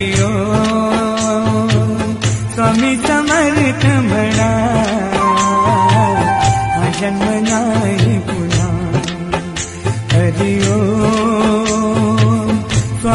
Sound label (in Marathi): hari om swami samarth mhana bhajan marathi